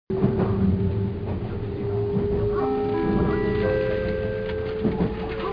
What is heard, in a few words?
Chime; meitetsu